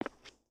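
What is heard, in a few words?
Foot Concrete Sneaker Walk, footsteps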